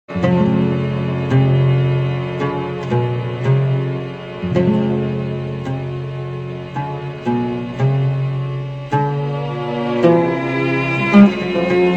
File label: tar, RingTone